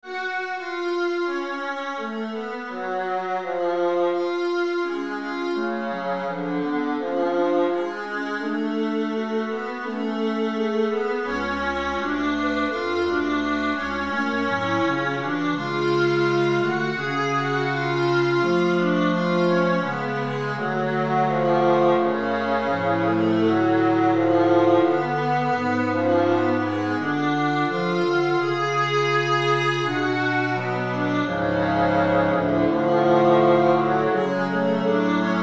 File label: Viola Concerto; I. Andante